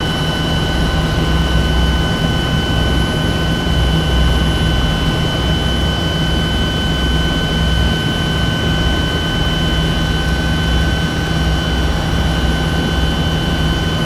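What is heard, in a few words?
Apache Interior Loop, AH-64 Apache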